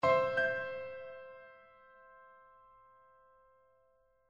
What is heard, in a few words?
Piano; stingers; reflective